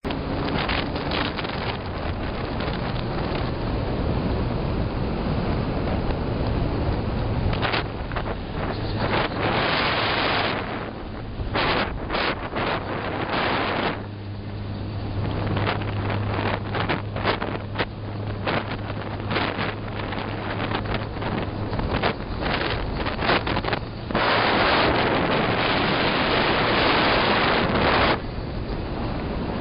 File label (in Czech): na motorce